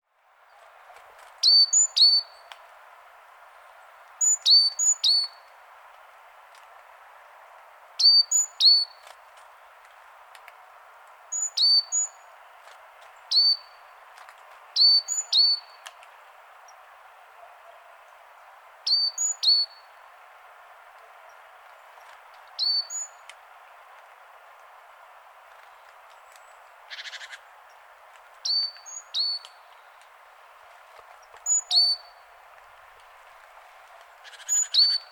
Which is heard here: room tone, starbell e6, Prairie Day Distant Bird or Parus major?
Parus major